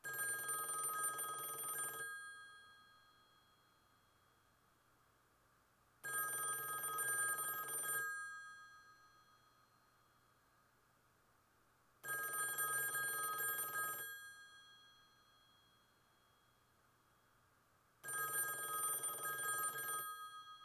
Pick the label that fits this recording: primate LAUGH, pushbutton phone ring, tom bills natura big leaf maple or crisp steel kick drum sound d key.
pushbutton phone ring